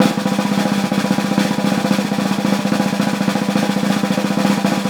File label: snare, Roland; LA Composer